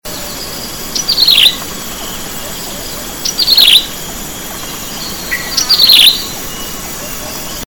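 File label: slender-billed crow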